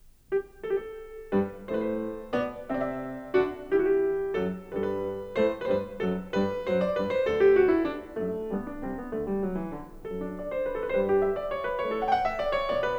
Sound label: Handel Keyboard Suite No8 HWV441; Heidsieck